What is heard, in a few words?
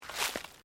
FOOTSTEPS THONGS (FLIPFLOPS) GRASS LEAVES STEP; Footstep Foley; Footsteps (Sound Effects:Human)